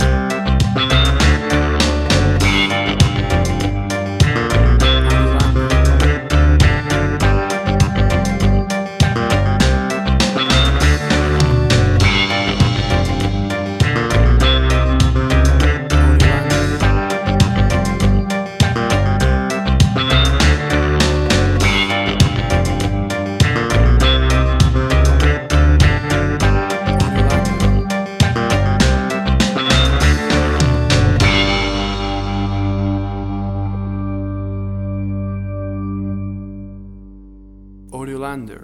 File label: Carribean Reggae Music